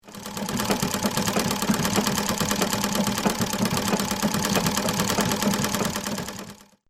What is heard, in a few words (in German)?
Haushalt Nähmaschine